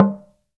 TALK DRM, Heart of Africa; Spectrasonics

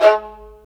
STR, Vas Marcato; STR Vas3 Marcato

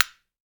SPOONS; Jew's Harp & Spoons

